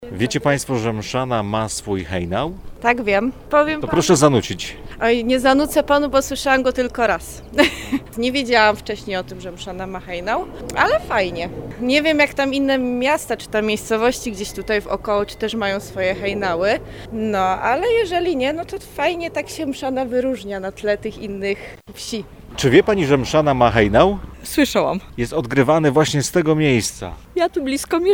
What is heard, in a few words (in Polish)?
hejnal